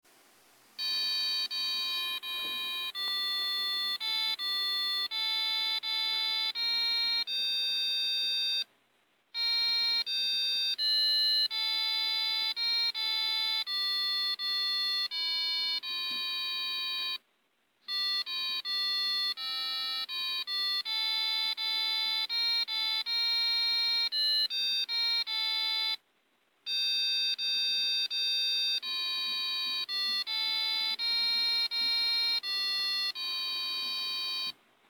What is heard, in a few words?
Piezo Speaker; Furusato